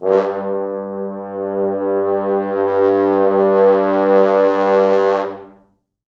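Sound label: 4 French Horns, Horns; G1; East West; Quantum Leap Horns Sax; 4 FrHorns MOD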